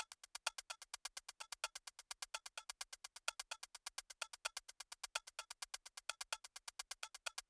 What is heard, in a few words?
Background Loop 128